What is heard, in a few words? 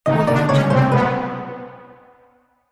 game lose